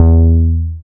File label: BAS MKS-50, Roland